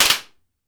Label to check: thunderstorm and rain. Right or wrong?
Wrong